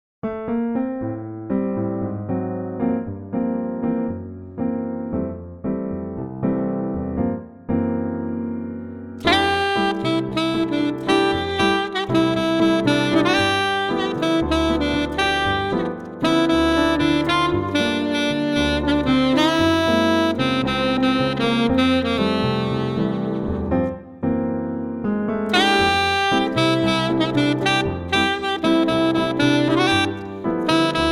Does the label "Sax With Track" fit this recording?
Yes